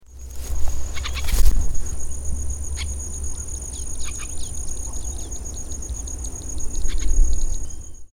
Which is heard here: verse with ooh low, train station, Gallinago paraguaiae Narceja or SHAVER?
Gallinago paraguaiae Narceja